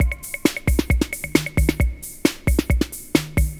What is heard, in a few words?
Drum Loops; Big Fish Audio; Prosonus 500 Drum Loops